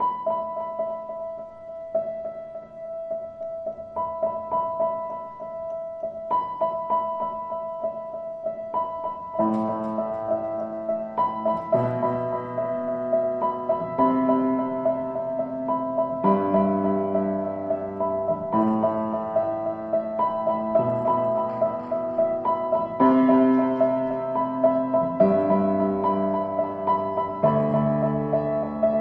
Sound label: pianissimo